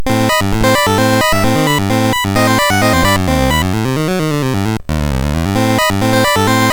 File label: Funk, sid MOS6581R3; hvsc